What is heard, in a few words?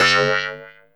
PLANG, Anime Sound FX; COMIC